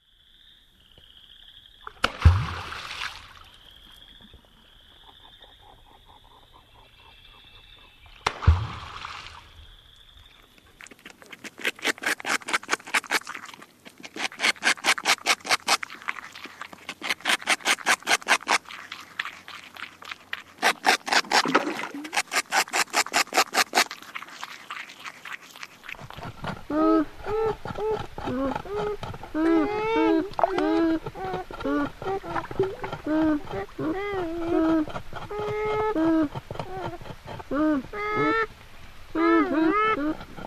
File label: american beaver; lang elliott